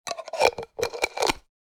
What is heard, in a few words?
Glass medicine jar lid closing